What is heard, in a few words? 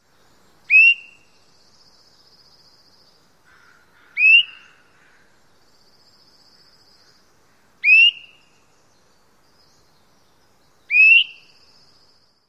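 SWATHR weep calls, Swainson's thrush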